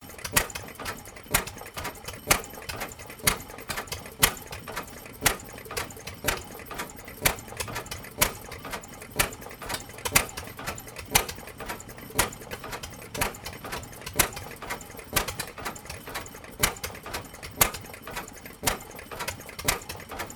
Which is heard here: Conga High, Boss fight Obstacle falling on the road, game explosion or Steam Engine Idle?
Steam Engine Idle